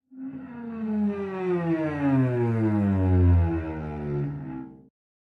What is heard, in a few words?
Cello Slide Down; SoundsCrate